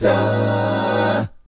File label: A13B5 AA, GUY CHORDS; Spectrasonics; Vocal Planet; Jazz FX